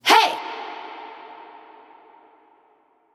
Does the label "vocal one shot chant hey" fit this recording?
Yes